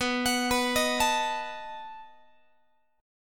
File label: B 7th B down Guitar; arpeggiated; x,19,21,21,19,20